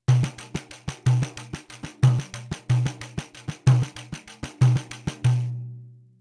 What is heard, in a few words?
Caixa, pandeiro